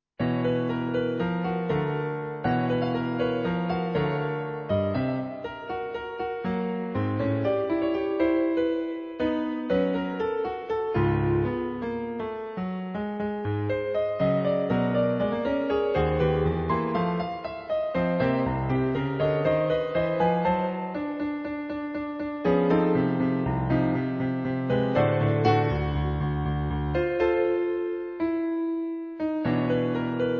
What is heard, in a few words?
Piano folk